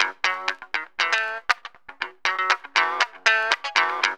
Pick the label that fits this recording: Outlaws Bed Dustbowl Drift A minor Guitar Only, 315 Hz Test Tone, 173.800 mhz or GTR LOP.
GTR LOP